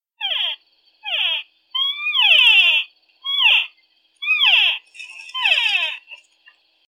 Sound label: elk whistle